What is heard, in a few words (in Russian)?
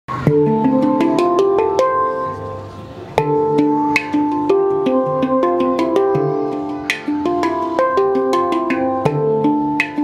Пример Звука PRO Ханг Celtic 9